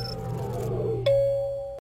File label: elevator